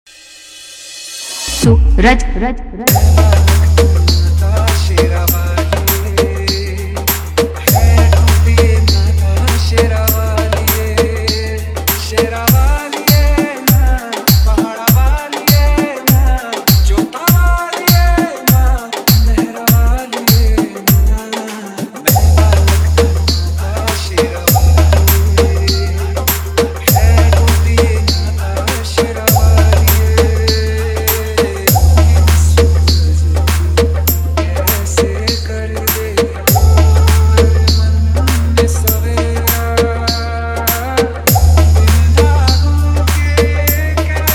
Trending Viral Bhakti Dj Remix Song Hard Vibration Beat